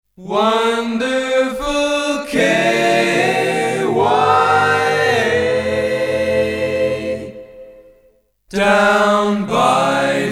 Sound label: Radio station jingles